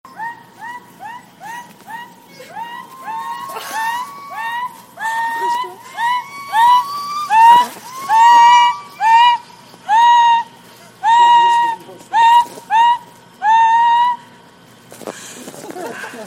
rire

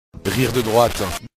rire de droite; antoine daniel

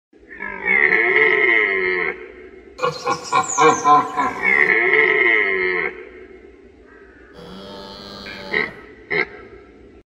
cris du cerf

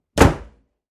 clac de porte

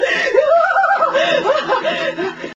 rire psyko

